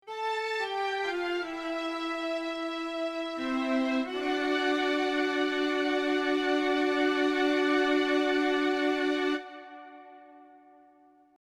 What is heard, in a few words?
orchestra original